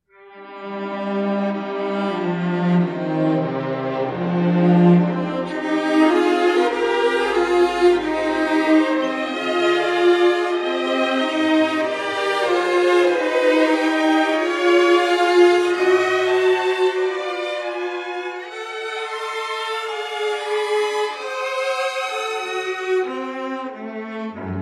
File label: Cello